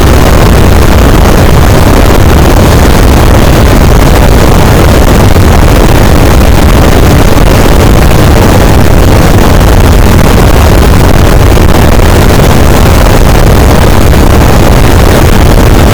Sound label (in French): cachalot negatif, boat, Physeter Macrocephalus